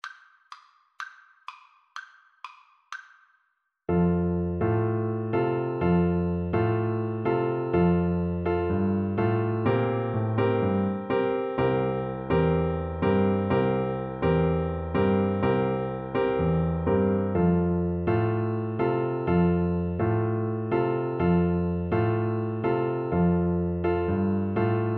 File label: la cucaracha FL kar, Flute